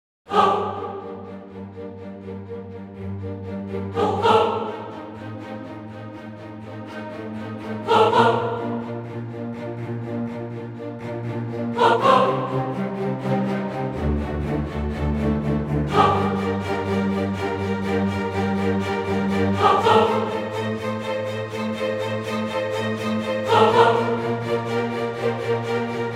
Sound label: Choir Strings; ProScores